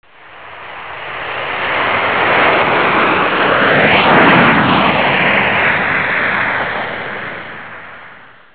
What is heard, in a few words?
jet Open; ABE Responsive Text Demo